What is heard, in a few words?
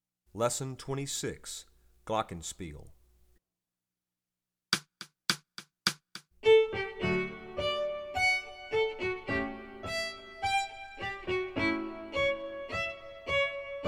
Glockenspiel